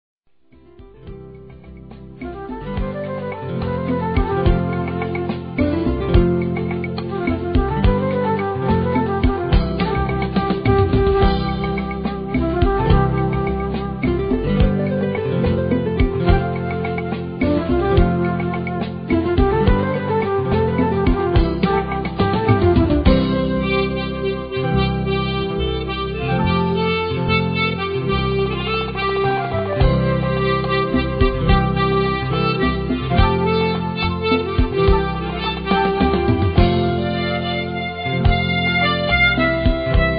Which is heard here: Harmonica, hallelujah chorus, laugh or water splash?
Harmonica